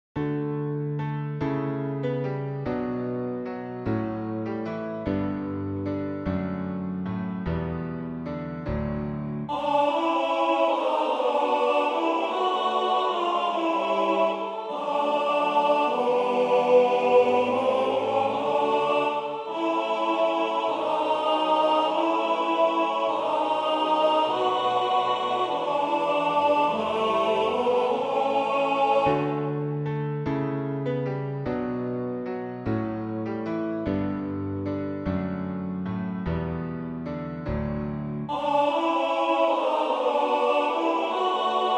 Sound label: Alto